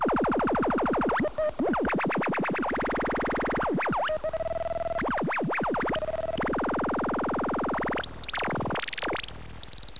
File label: SHOTGUN